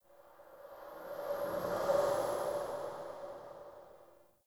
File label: Fey Eldveil Latent Random, Powers